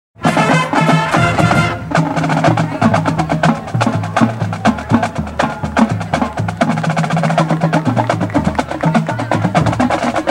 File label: Drum Feature, drumline